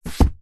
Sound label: household book thick hard back cookery set down carpet